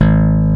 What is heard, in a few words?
BS BS GTR, Funk Bass Soul E. Bass; Roland LCDP02 Guitar and Bass